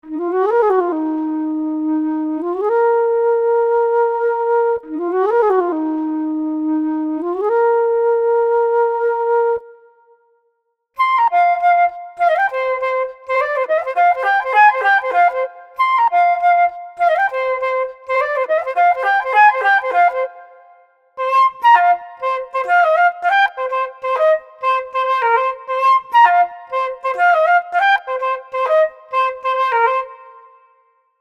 The Flute

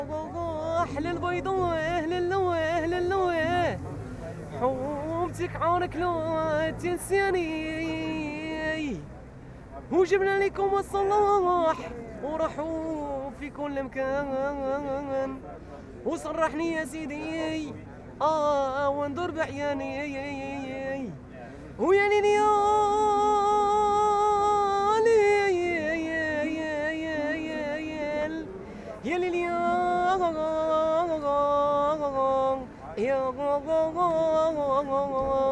Mooccan Singer

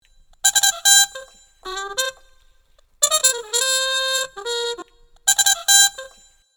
muted trumpet

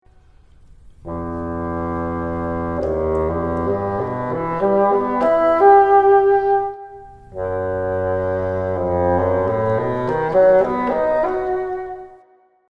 The Bassoon

